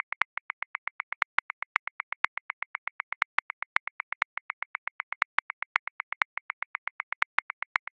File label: Kuduro Perc Accent 16ths 130 bpm; Grooves; Ableton Live 12 Lite; Core Library